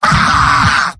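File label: laugh short, male; demoman; tf2; garrysmod; mvm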